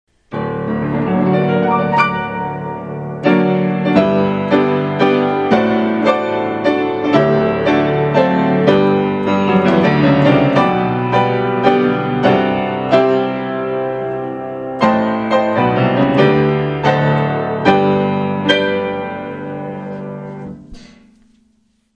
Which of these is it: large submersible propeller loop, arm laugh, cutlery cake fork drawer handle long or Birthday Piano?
Birthday Piano